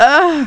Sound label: Moan; MacFoxes Adult Game; Girl2Dungeon